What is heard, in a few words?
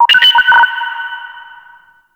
Interior Orbital Station Telemetry Mid; Warframe; Tau